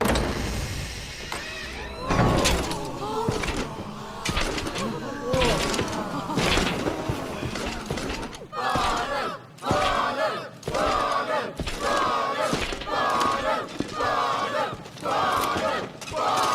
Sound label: enterance